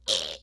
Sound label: death, snake; midnight guns